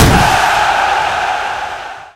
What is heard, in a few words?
Movement American football score; Talking Tom Gold Run